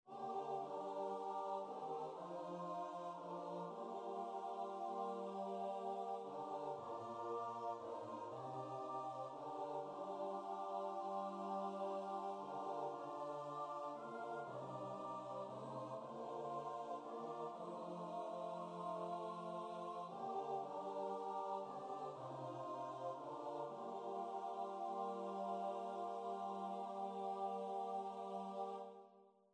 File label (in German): CHOR; Wierzba